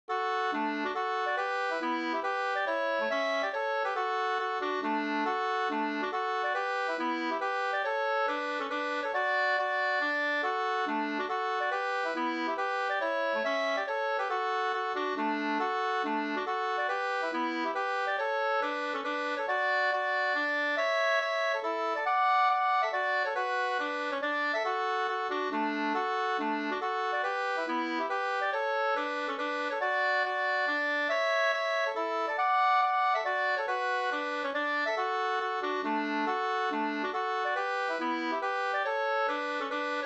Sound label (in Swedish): polska Treparsdans; Sodertorn